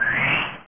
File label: Fly Off; ROTATOR